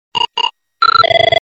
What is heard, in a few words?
Notification Tone